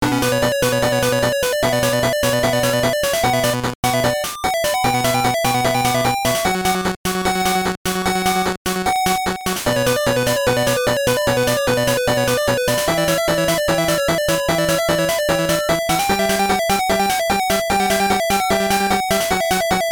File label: Tension